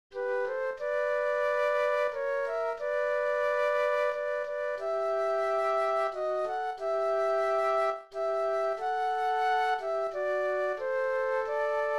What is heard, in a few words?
Flute Duet